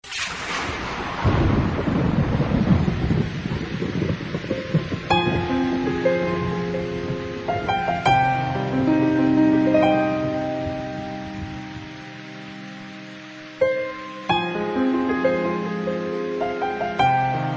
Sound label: Rain Piano; 01 Rain Piano